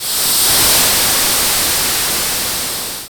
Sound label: Explosion